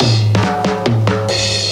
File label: Fills, Loops 140 BPM